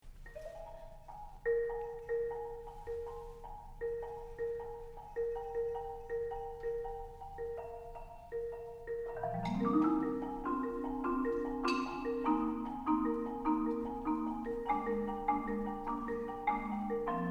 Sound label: percussion ensemble pulse